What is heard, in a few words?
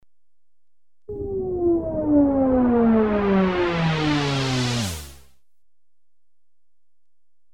Descending projectile passes left to right (lower pitch), Electronically Generated Sounds; Sound Effects Library